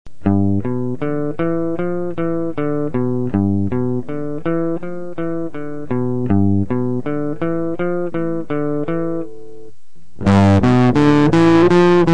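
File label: Muffer, guitar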